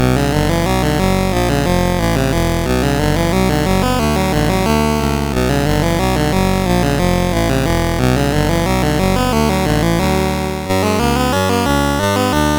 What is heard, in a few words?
Mountain King, sid MOS6581R3